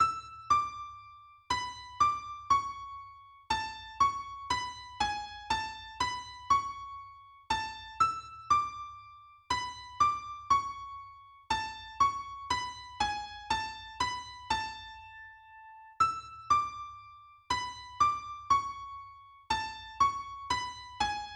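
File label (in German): Ein niederländisch Tänzlein Solo E-Gitarre; Newsidler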